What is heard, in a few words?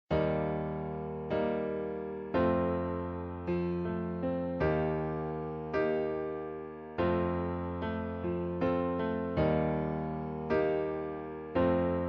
karaoke backing track; school of rock the musical